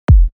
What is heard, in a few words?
Kick 45Hz F; Mossy